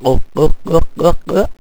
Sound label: potion; Castle of Xhentars